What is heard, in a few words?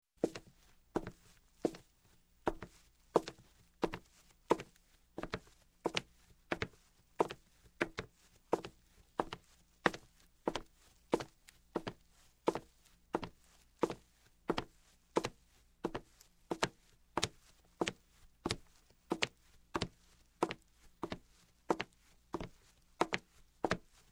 Footsteps, Hardwood - Female; Sound Ideas 2000 Series